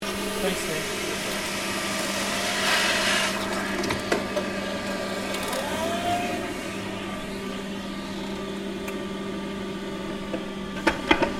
Cleaner Hakomatic B70CL Shutdown, Gas powered floor polisher; Cleaning; Household